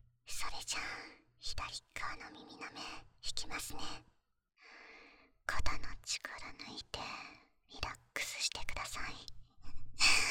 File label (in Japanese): 左耳奥舐めマッサージ, ASMR